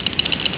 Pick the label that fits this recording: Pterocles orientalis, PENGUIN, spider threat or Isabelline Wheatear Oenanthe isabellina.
PENGUIN